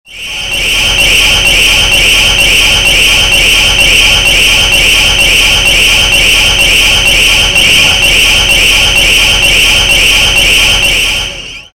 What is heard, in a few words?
LRAD tone